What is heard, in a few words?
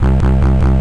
wawawawa; wingames